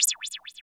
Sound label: MD OPEN; East West; Phat & Phunky